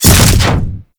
Body Slam; Ash Storm; Warframe; Ninja

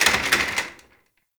Office Chair Impact; Warframe; Ambience; Nineteen Ninety Nine

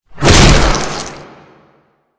Infested Ancient Small Arm Swing; Enemies; Warframe

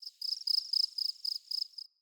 Forest Open Glade Cricket Close

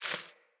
Sparrow Quick Move; Animals

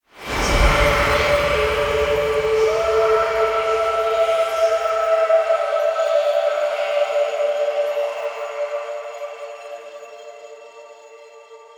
Tailor Shop Door Portal; Nineteen Ninety Nine

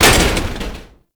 Metal Crate Destroy; Gameplay; Warframe; Tau; Destructible Dynamic

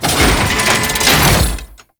Gatling Gun Activate; Warframe; Tau Gatling Gun

